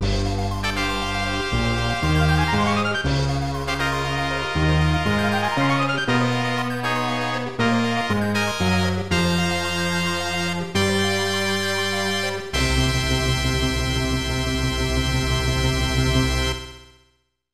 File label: Map Clear